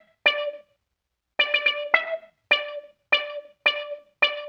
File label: Wah Synth